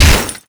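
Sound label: metal gloves hit robot; melee impacts; Garry's Mod; tf2; mvm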